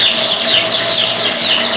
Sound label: baby chicks, farm animals; Sound Effects for Multimedia - Volume 1